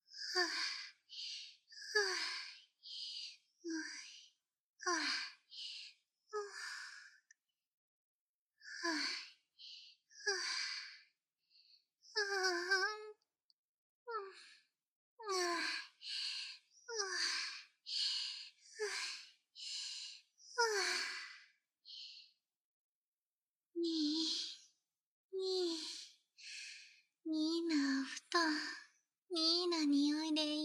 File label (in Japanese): にぃにのお布団の匂いを嗅ぎながらオナニー, バイノーラルマイク収録 効果音なし